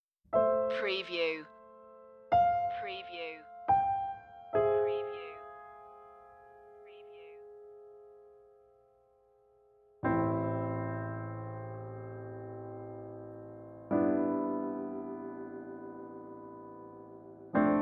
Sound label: emotional piano cue